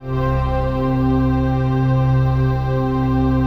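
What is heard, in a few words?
chord pad, Pads; Best Service; XX Large - Pads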